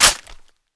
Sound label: Bolt Release, weapons, MP5